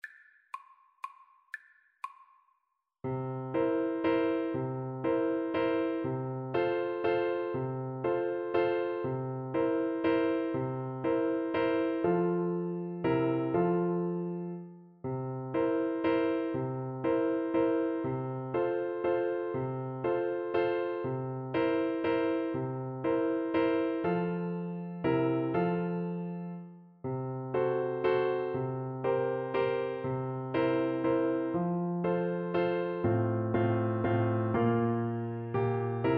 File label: german dance, clarinet; Schubert, Franz - German Dance, D.420, No. 2